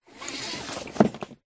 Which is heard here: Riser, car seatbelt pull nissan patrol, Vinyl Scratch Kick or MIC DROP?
car seatbelt pull nissan patrol